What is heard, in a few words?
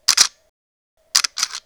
D300s quiet mode; Nikon